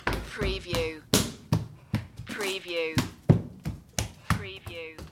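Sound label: Footsteps Kid Wood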